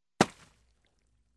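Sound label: wet knock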